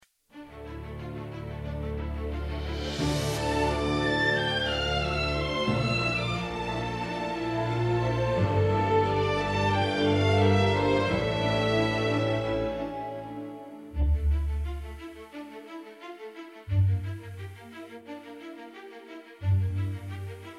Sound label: Instrumental; King